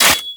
Bird Three Scratch; Ambience; Warframe; Entrati

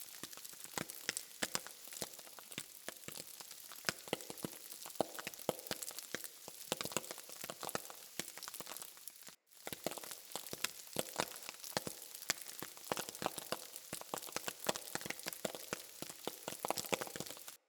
Rain On Suit Loop, Exterior; The New War; Ambience; Narmer Outpost; Drifter

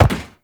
Step Metal, Movement; Uriel; Warframe

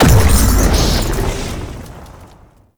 Flight Start Impact; Warframe; Uriel; Infernalis

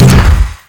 Gas Grenade Launcher A 3d; Warframe; Weapons

